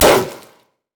tiger attack roar; LoL; udyr

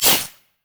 spider e descent; Elise

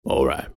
attack; Armordillo

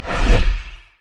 basic; LoL; fiddlesticks

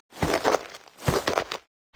dance windup; LoL; janna forecast

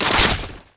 basicattack; jinx base

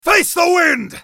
spellcast effort; Yasuo; en US

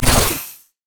decimate; LoL; darius zaunknight

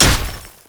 basicattack human; LoL; shyvana

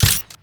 Ball Flash Reverse; orianna

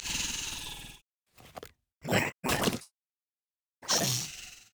lizard elder bored; LoL; monsters

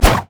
basic attacks; Ziggs; poolparty

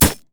shen basicattack; LoL

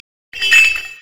brazier chains

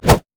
crit attack; malphite

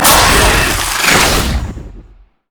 spider vortex; LoL

